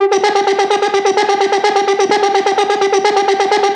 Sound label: arp arp arp